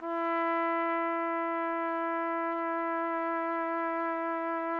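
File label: TPT, trumpet; Roland - LA Composer vol. 3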